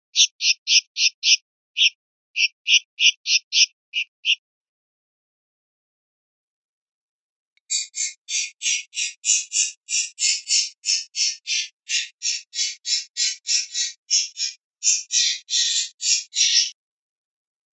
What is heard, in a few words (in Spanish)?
cosqueos, pardillo